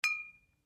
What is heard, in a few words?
Bottles Clinking